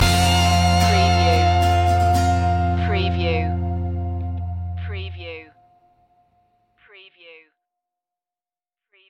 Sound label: loop ending no piano